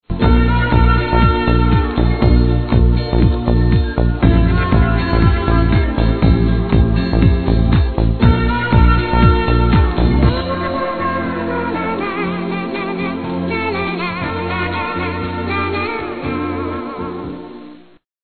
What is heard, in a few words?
music love song